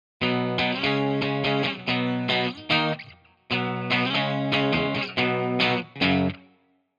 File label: les paul wet; chiqui audio